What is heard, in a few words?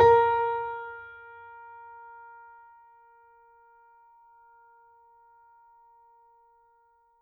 mini grand; bananenkeyboard